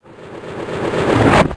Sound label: ALIEN, creepy sounds